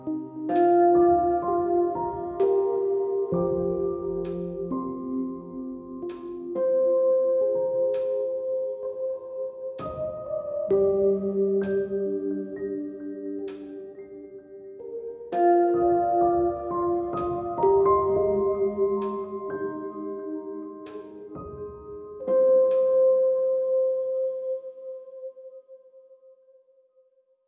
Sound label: Chill Outro, Solos; BB 2HTC Samples Vol 4